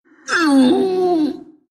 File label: pain ru